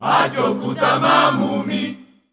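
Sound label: Rhythmic Chants, Voices Of Africa, 90 bpm; Aju Kutama Modmi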